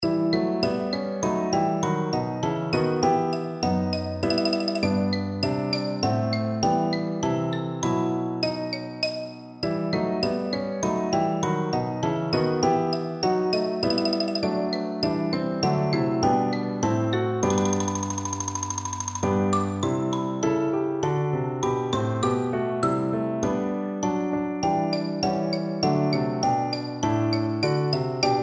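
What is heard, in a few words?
xylophone, percussion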